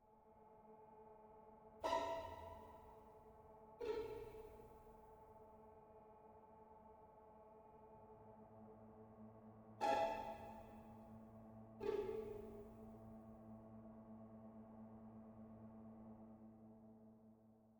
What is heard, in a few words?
ambiant dark